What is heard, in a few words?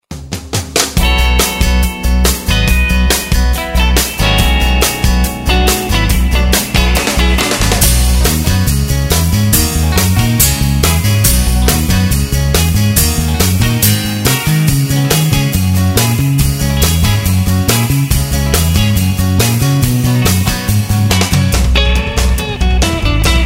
jingle